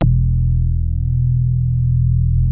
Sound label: Prophet 5, E2; Hollywood Edge - Giorgio Moroder Rare Synthesizer Collection